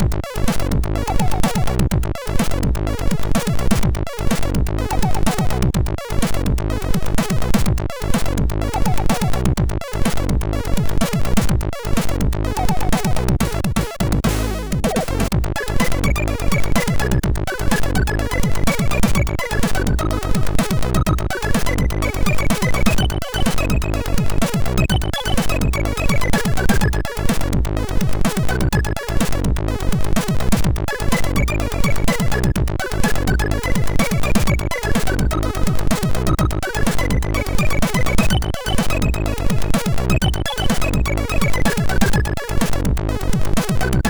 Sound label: Party Music, sid MOS6581R3